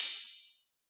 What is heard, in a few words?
Boss DR-202 hat